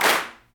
CLAPS, Africa Handclaps

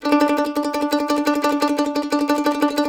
C E trill D, Blues Guitar

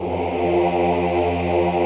OHH F1, Classical Choir; Best Service; Extended Classical Choir

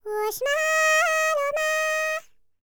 HIMALAYAN, World Voices; ILIO; Vocal Planet VOL-5

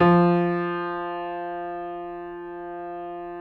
JAZZ F2, Keyboards Acoustic; USB Soundscan vol.17

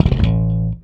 Slap One Shot A, Bass; Bass X

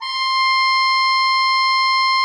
C6, Cathedral Organ; Propeller Island

